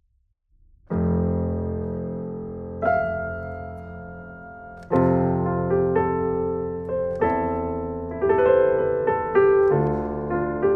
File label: PIANO